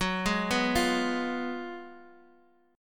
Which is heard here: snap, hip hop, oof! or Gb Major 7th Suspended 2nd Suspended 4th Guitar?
Gb Major 7th Suspended 2nd Suspended 4th Guitar